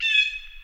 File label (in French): geai; simscene-py